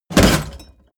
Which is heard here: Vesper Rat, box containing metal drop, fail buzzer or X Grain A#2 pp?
box containing metal drop